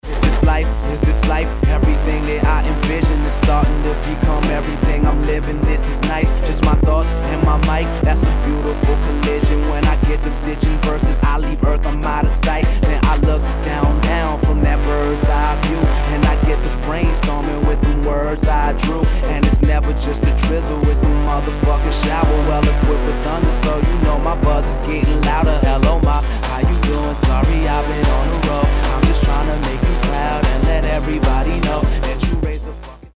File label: Hip Hop Before